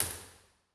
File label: Early Reflections Synagogue Wide L, impulse responses; Ableton Live 12 Lite; Hybrid